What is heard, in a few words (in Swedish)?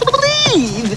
Fel bokstav; HangMan1.3